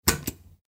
壁のスイッチ

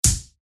ブーメランぶつかる 通常攻撃; 武器効果音

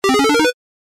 アイテム出現 レトロ

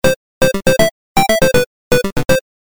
ファンファーレ レトロ; モノラル

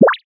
ぴょこ

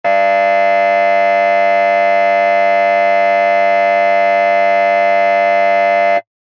劇場やホールの開演ブザー 長め リバーブ無し; 演劇・舞台

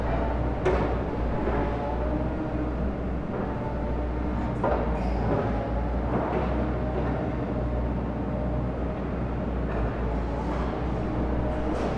工事の音 その1; 環境音

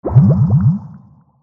ポーション

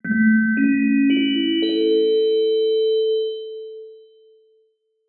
お知らせ 始まり 遅め リバーブ有り; 戏剧音效

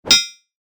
スピア モノ; 武器効果音

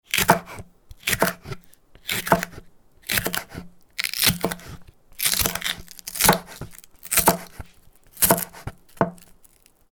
野菜を切る音・ねぎ; 日常生活の効果音

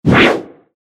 剣 空振り モノラル; 武器効果音

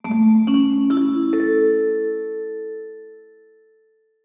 お知らせ 始まり リバーブ有り; 戏剧音效

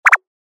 ブロックを動かす

